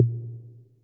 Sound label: snap, Yamaha Tenori-On Snapkit